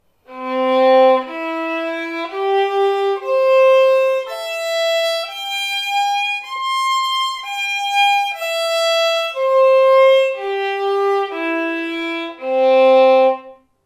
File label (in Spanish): do arpegios, escalas; do 2 arpegios; mi primer libro de escalas